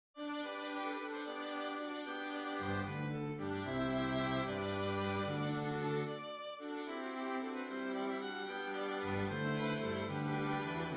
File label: Hymn Strings